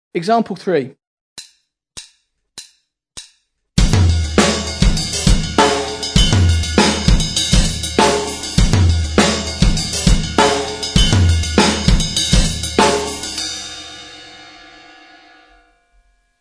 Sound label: drum set; Independence day 16